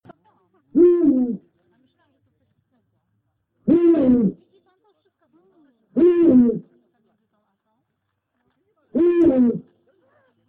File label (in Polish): puchacz